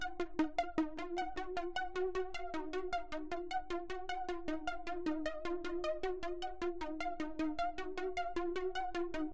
Storm Arp; Solus Project

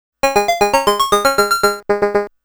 Monster Bye-Bye Music; Namco Classic Collection Vol.2; arcade soundtrack

